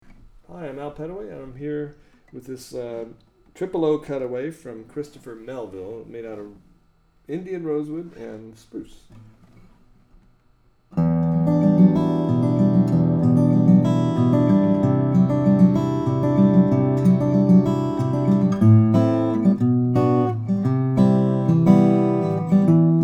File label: 000 standard, steel string guitar flattop; melville